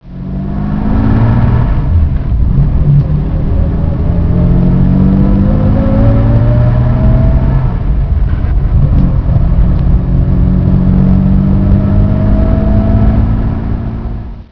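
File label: jaguar 340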